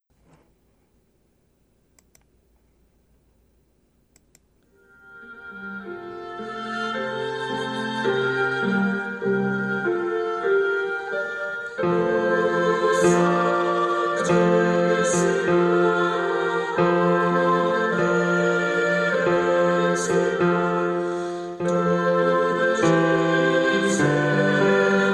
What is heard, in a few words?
O Sanctissima Bass